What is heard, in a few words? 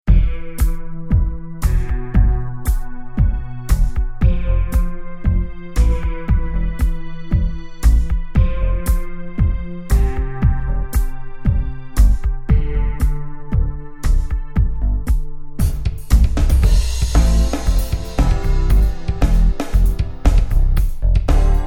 C116 32 Bar Groove